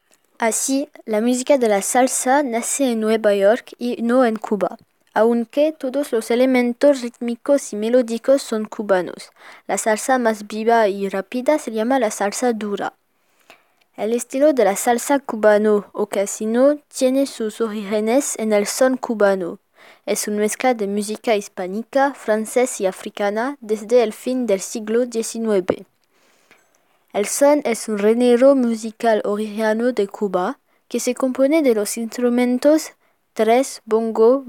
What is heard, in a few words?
Vocal